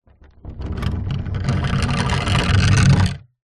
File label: foley wood large board drag ground